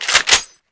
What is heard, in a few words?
m3 bolt; weapons; svencoop